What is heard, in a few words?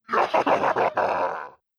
laughter of evil